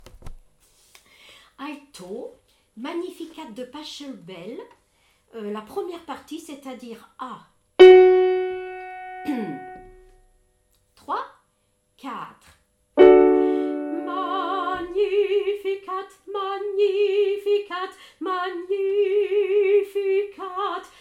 Magnificat Alto; Pachelbel

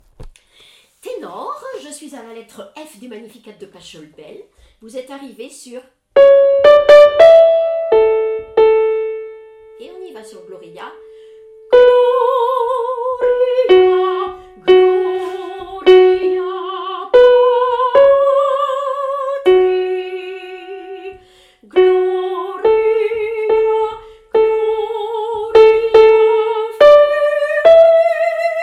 Magnificat Tenor; Pachelbel

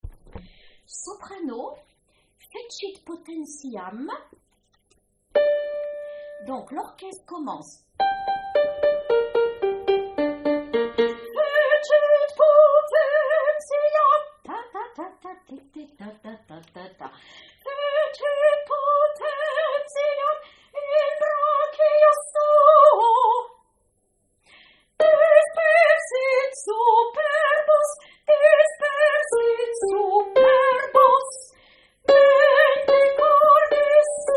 Magnificat Soprano; Vivaldi

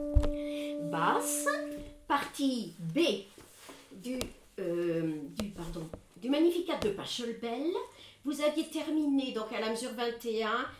Magnificat Basse; Pachelbel

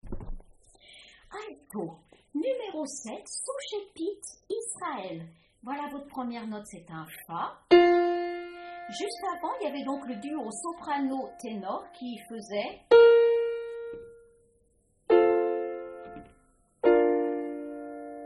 Magnificat Alto; Vivaldi

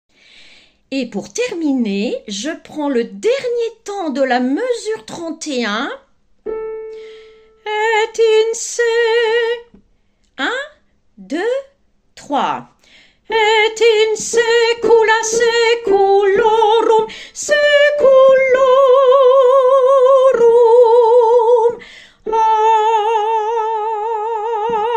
Allegro Sop

